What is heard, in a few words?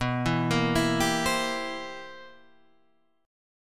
C 7th B, C7/B chord; arpeggiated; JGuitar